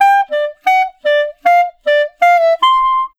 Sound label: Clarinet Solo